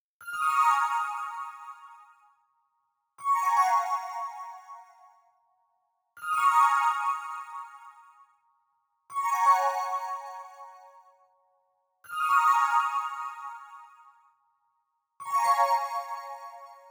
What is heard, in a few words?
bell pad, Smooth RnB, Ebmin; Big Fish Audio; Legendary; Happiness